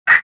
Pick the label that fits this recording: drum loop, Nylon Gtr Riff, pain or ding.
pain